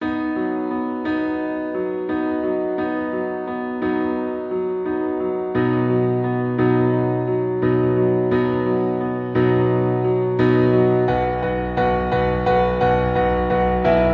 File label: Piano Cover